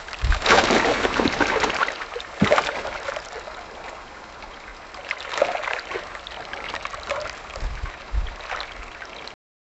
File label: pigeons focused diving